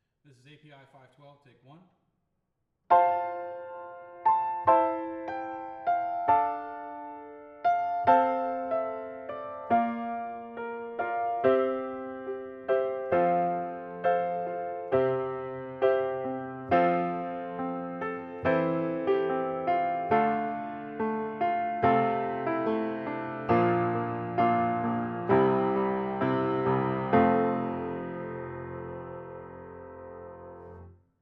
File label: Piano; mic pre shootout